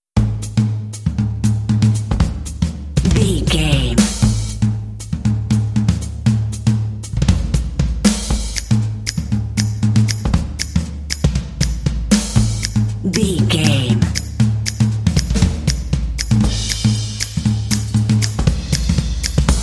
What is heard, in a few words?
jazzy beat hipster cat drums only